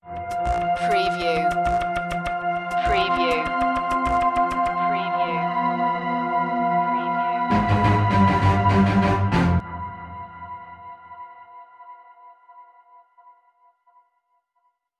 alien landscape sting

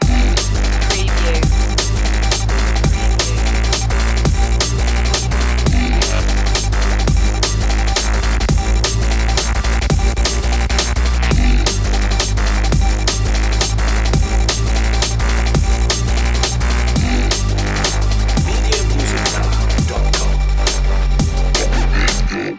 subharmonic panic loop

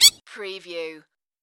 Duck Chirp

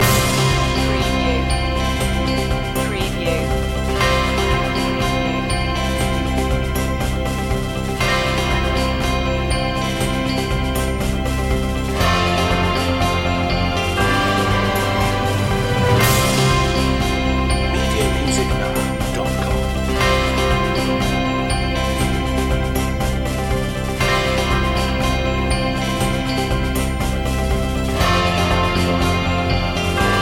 loop

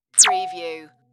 Zap

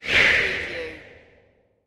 Whoosh; Sound Effects Bible

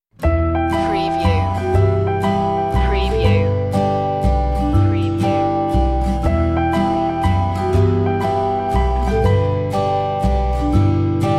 waltz

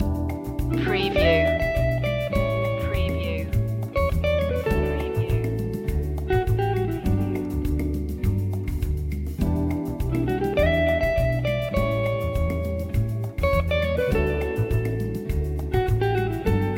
happy rhythm loop

